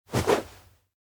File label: frisbee throw; anemoi